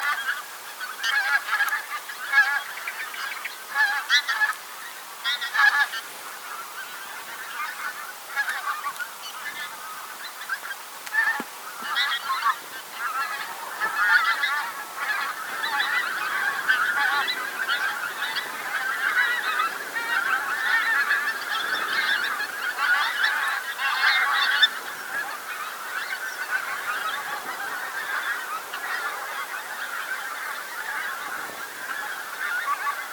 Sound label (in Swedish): Spetsbergsgas